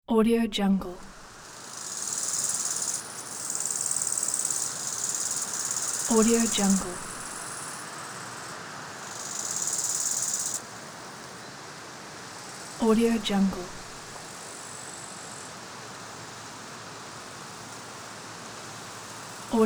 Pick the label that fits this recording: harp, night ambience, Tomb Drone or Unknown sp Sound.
night ambience